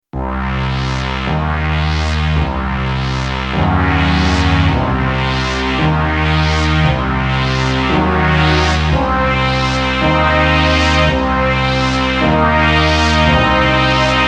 Moog woosh

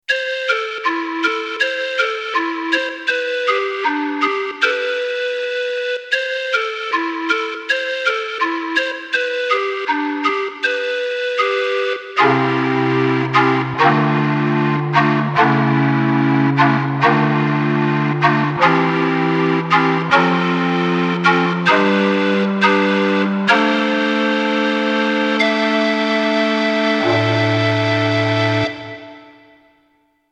Fairlight

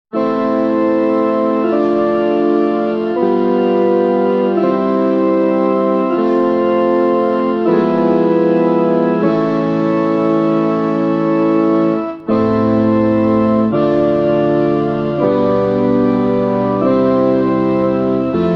MkII Church Organ

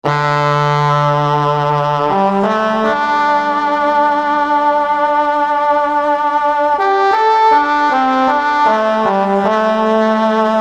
trombone

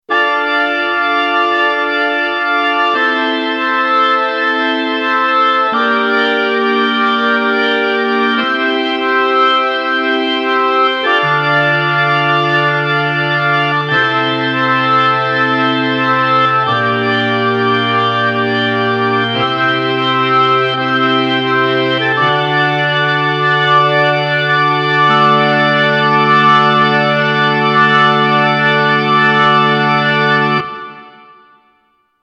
Pinder Organ

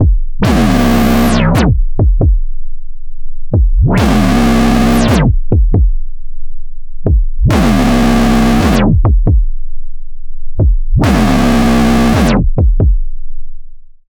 Angry Press Kik, Fourm, SEQUENTIAL; Angry Press Kick

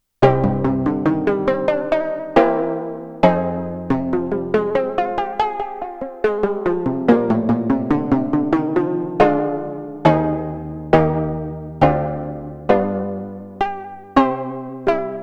NX7 KOTO

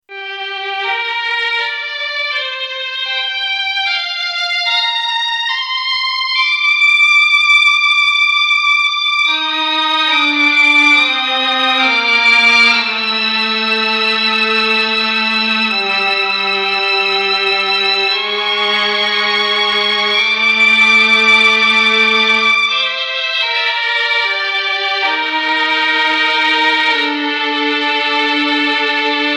Violins

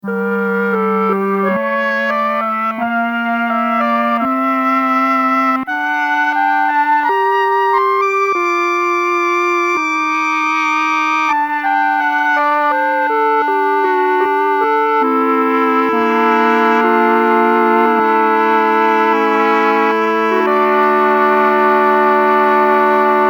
clarinet

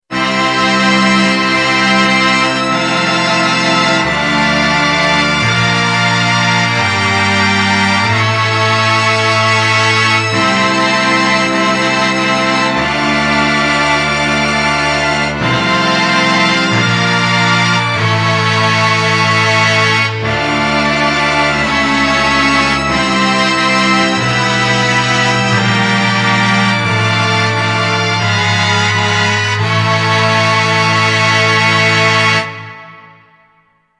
Strings Cello

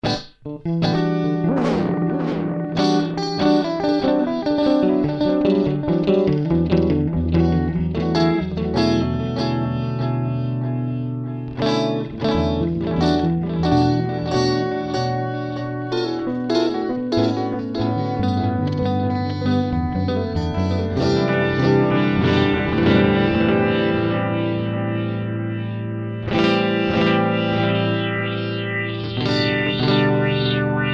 Guitar

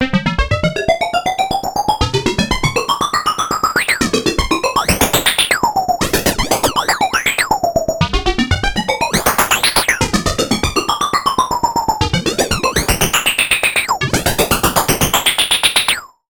Filter FM, Fourm, SEQUENTIAL; 4 ポール・ローパスフィルター

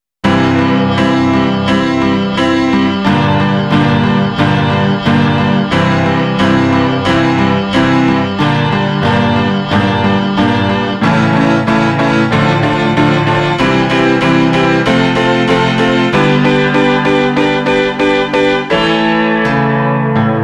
jangle piano

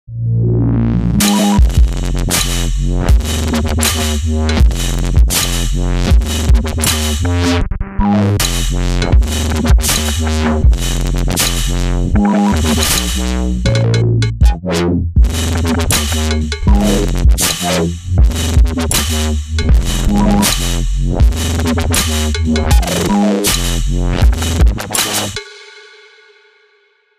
glitch mix; blipblox

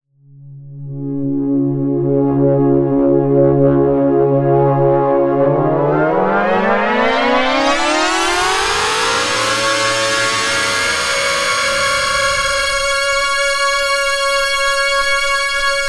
Xmod Pad; TEO-5

